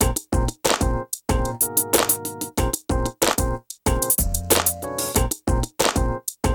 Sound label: BEAT, Beats 93-96bpm; PlatinumPhattCD1; E-MU Producer Series Vol. 8 – Platinum Phatt